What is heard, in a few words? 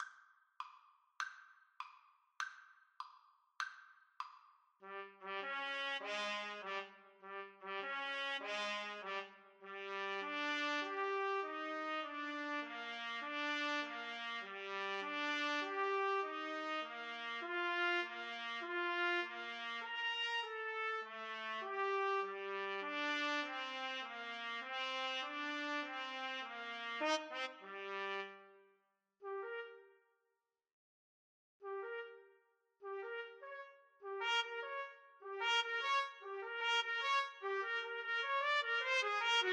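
when johnny trumpet duet karaoke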